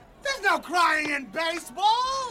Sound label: Crying